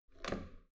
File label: metal door heavy handle up